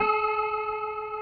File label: GUITAR FX